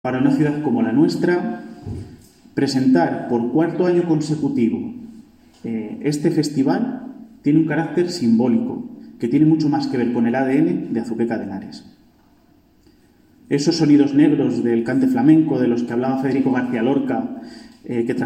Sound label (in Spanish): flamenco